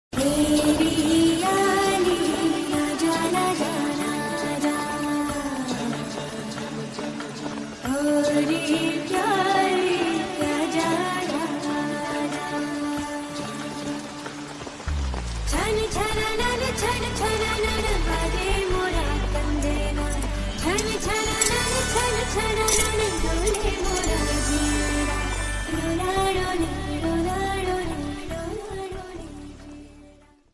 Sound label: malayalam song bgm ringtone